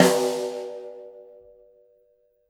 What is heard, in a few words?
KIT SD, Drums Acoustic; USB Soundscan vol.10; AKAI; 90s sample CD; ALL SD